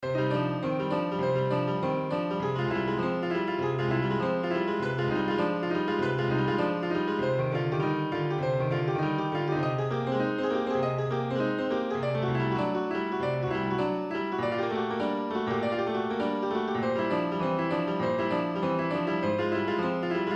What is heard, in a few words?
synth